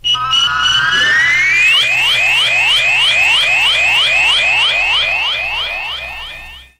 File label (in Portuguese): sinal de tempo e placar radio; radio globo